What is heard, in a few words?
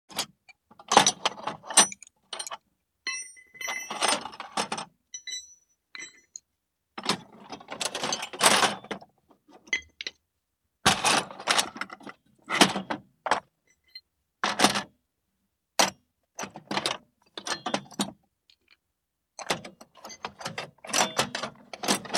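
toolbox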